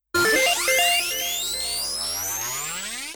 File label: Fast Music Hit; All Star Fruit Racing